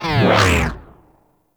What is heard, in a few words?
Proj Small Fire; Warframe; Lizzie